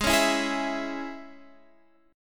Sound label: C sharp Minor G sharp